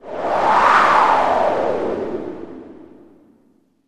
wind

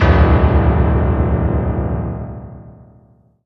piano

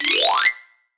xylophone

